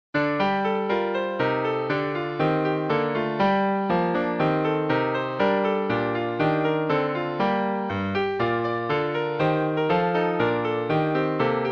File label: Hymns